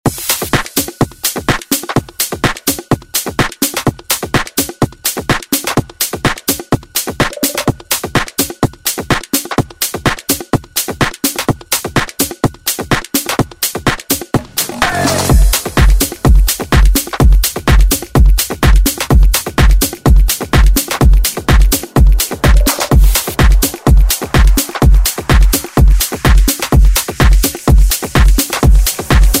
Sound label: Tech House; Deejay Hit